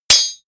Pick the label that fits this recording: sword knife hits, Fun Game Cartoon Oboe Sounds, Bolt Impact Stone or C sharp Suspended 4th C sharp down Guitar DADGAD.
sword knife hits